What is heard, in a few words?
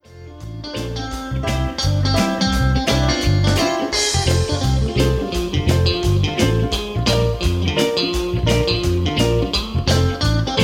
Reggae; Sans Voix, Sans Choeurs; Vitesse identique à l'originale; Fin nette, sans fondu du volume (fade-out)